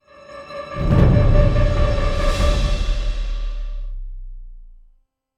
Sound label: Sting Death